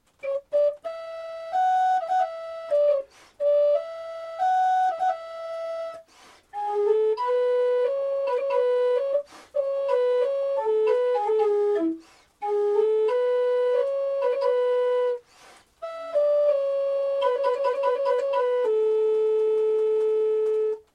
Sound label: Portative; Noel Bourgignon